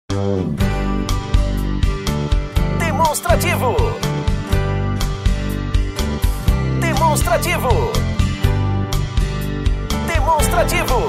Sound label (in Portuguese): tema espera